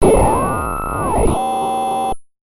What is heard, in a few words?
Radio Relay; martyn stonehouse